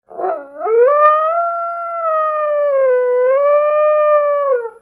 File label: howl; The Elder Scrolls III - Morrowind; were